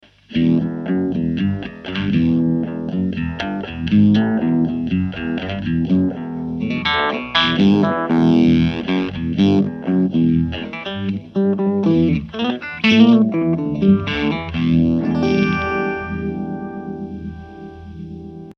vibe fuzz oktave down

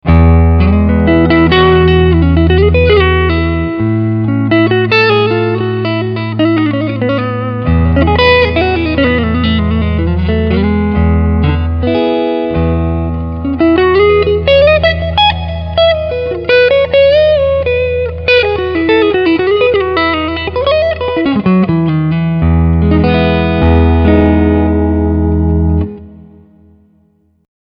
Preset Tweedy Clean

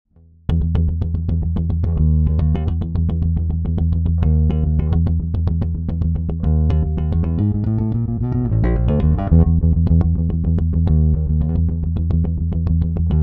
Preci rolloff

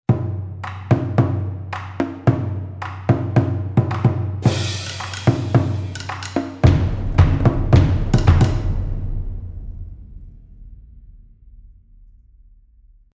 Percussion

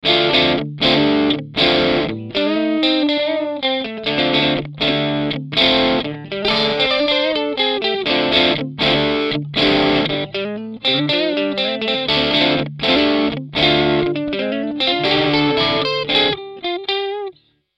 Mooer GE 300 UK30 OD

